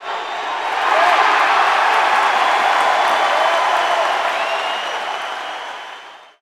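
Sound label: Basketball Crowd Cheer; SFX Kit; Crowds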